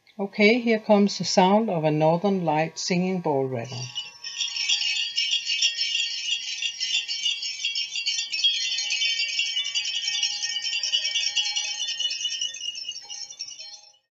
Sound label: Shaman rattle Singing Ball; Northern Light